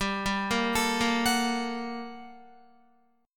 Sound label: G Minor Major 9th, down, Guitar; arpeggiated; JGuitar